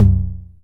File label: 909 LO TOM, TR909